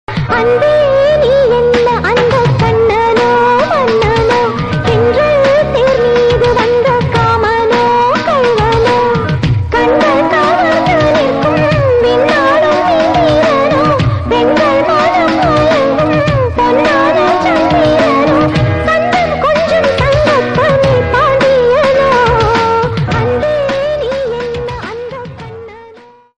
Tamil Song

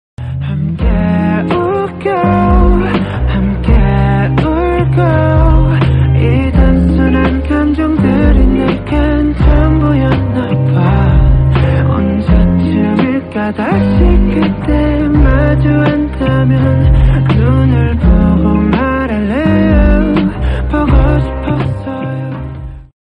Slowed Korean Song

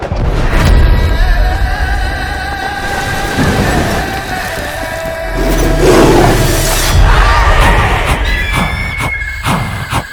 BGM Ringtone; Kanguva; Suriya

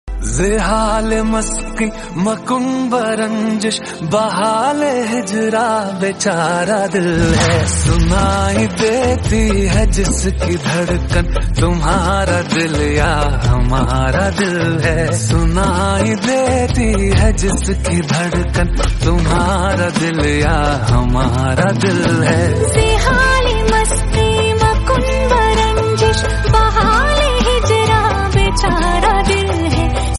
Male Version Hindi; ringtone

